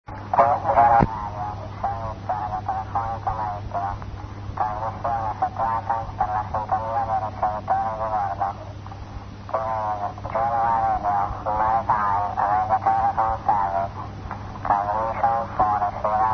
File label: genua radio